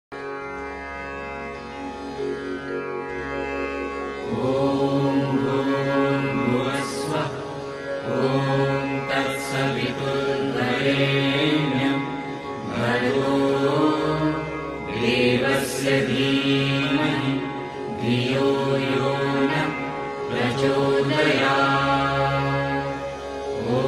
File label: Gayatri Mantra Ringtone; ringtones